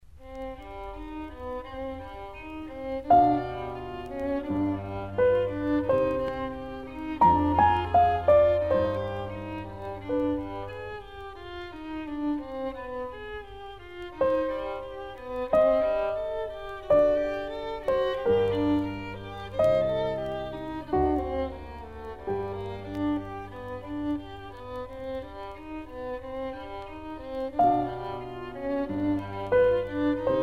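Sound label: violin sonata; weber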